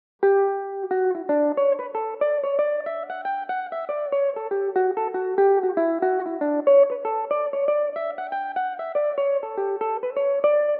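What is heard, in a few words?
D major belmuse, irish mandolin; kittys rambles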